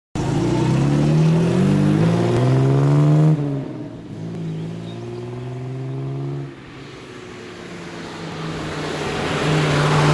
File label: Jaguar